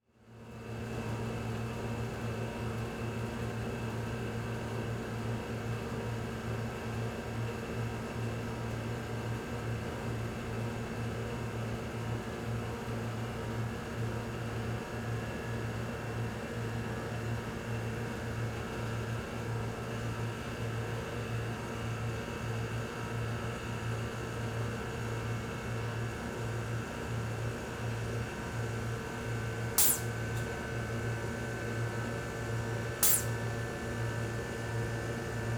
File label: Ambience Soda Syrup Machines; AdobeCC